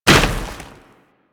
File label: Moa Step Snow, footsteps; Enemies; Warframe